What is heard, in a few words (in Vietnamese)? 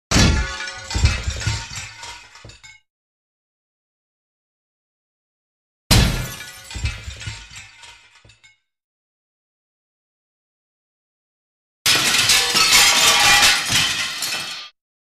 tieng dap vo qua cua kinh